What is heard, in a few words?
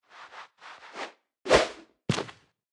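Baby Goblin, 动作音效; 哥布林; 绿野世界; 部落冲突; 皇室战争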